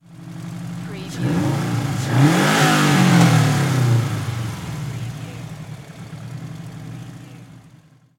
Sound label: SUBARU STI ENGINE DOUBLE REV